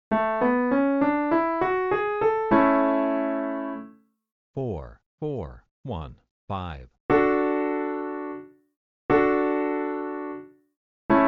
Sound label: I IV V Easy; Training Exercise